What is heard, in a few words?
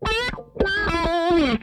DRIVER LICK, Funk Guitar; 90 s Sample CDs; Zero G